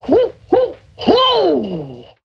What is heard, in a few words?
ho ho ho; xmas